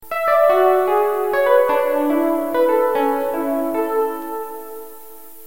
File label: nokia classic